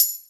short hihat sound f sharp, Royality free hi hat closed sound tuned to the F# note; hat samples